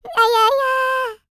LICKS, Vocal Planet Jazz FX; Spectrasonics

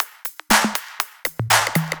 Groove 120; Dance Mega Add On Grooves

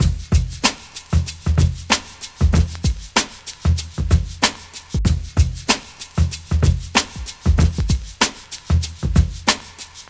Straight Kit, World Pop Beats

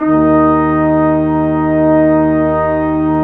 BRS QUINT, Brass Sections, BRS_Quintet; Roland LCDP06 Brass Sections

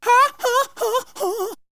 Vocal; ZERO G - Datafile DISC 1

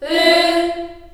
F3 CHS EH, CHILD EHS, Choir; USB Soundscan vol.28; Choir Acoustic & Synth; AKAI

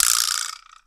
Vibraslap, Drum Machines

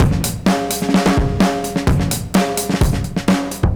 Funky Drums 128; musicradar

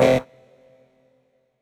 Dance Mega Synth; Best Service; 90 s Sample CDs

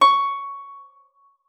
C6, HARP; Xsample VOL-8; HARP P+MF

